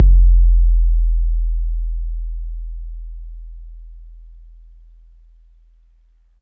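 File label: bass drum 808 MPC60 Click E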